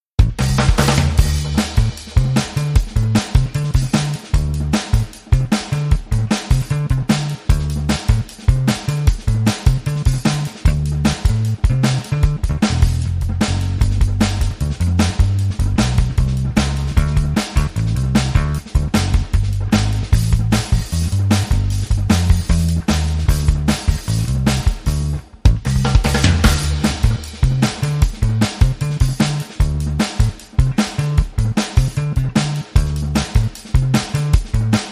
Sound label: Blues, DnB